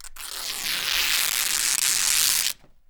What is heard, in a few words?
RIP, Canvas Bag Rips